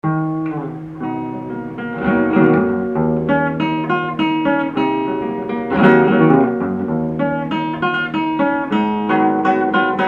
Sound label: Jazz Melody